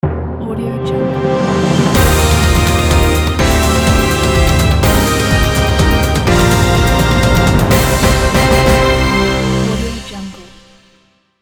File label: the news logo; hotplace